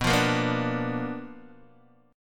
B Minor Major 7th Flat 5th Guitar DADGAD

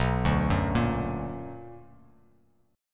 B Minor Major 7th, down, Bass Standard; arpeggiated; JGuitar

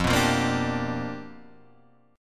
G 7th Sharp 9th F chord

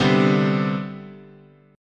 C Major 7th Suspended 2nd, guitar chord

